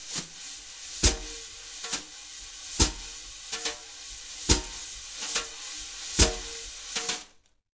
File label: BALLAD, Jazz Latin Drumloops; USB Soundscan vol.08; AKAI